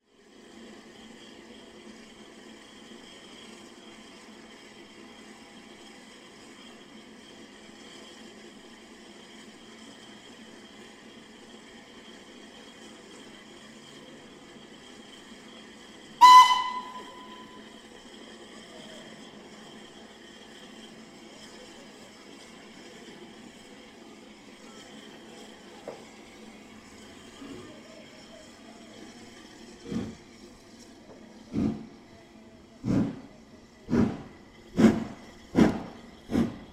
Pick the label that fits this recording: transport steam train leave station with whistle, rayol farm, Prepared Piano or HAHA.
transport steam train leave station with whistle